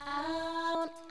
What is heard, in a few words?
"oh" SUNG